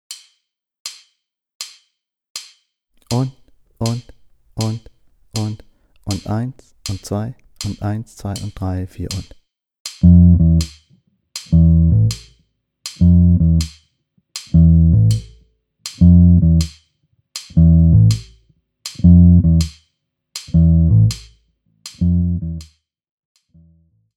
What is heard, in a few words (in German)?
guiro klick 2 und 4